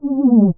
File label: Battle Hit; Hylics